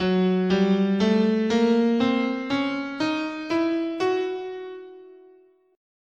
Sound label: F sharp Diminished Half Whole, scale